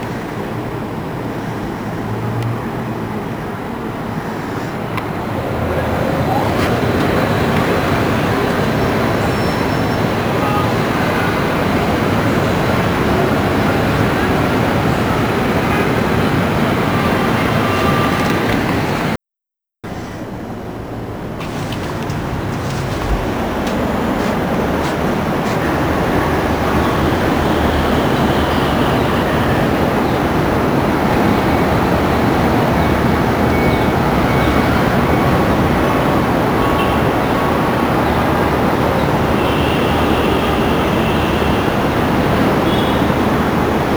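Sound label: NY street traffic, exterior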